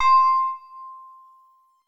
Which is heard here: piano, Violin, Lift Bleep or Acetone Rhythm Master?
Lift Bleep